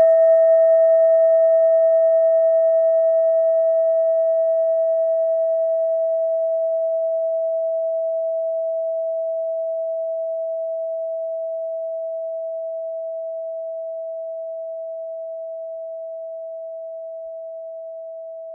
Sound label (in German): kleine klangschale